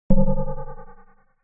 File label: science fiction door open beep tone; zapsplat